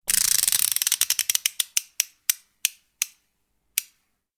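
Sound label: sound of a safe dial turning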